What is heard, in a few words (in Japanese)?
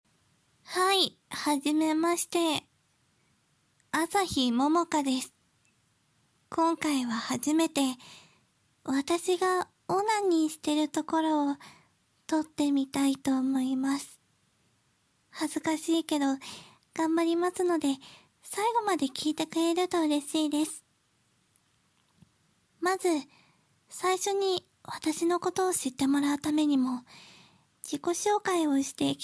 初ガチ実演オナニー; THE FIRST ORGASM; 久しぶりのオナニー恥ずかしい...勝手に腰動いちゃう...